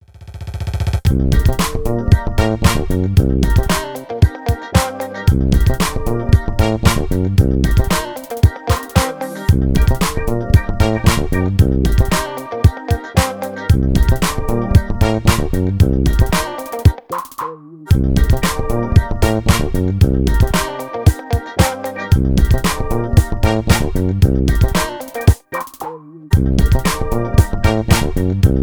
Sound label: BASS, Bass Grooves; USB Soundscan vol.30; AKAI